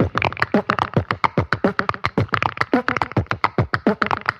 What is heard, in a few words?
give em an example, beatbox; Drum loops